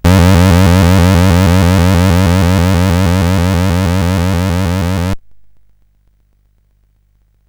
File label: sid CSG8580R5; hvsc